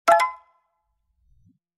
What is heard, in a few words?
ding; automaker